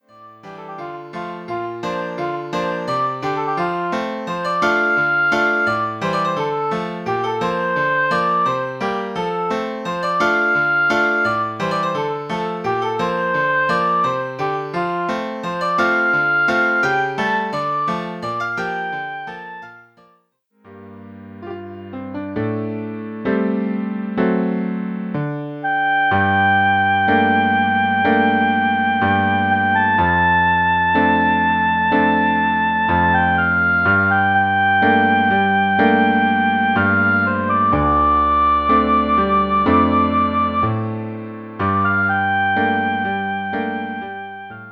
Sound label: Oboe and Piano